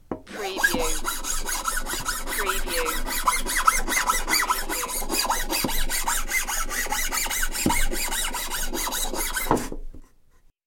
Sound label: Wood File